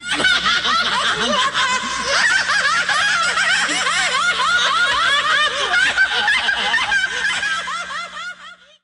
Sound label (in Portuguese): risadas